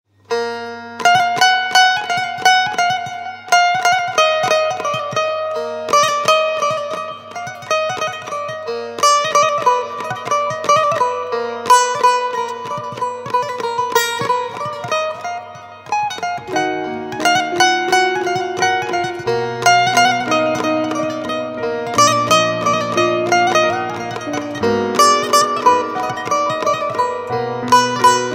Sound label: baglama cover; degmen benim gamli yasli gonlume